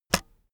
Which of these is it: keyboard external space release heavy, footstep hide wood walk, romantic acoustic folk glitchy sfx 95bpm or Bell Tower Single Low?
keyboard external space release heavy